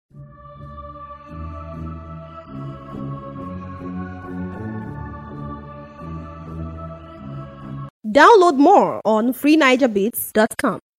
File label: Type Beat